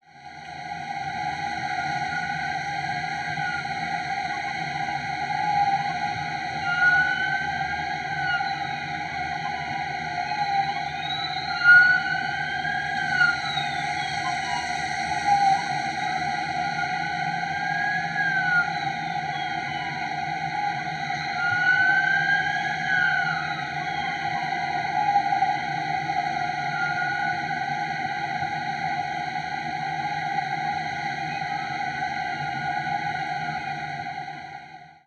Ambulance

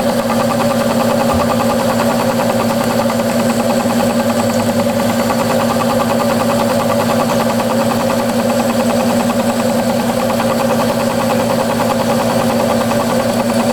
dishwasher

